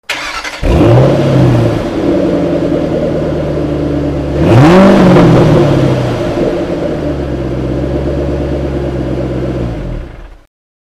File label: start and rev, mustang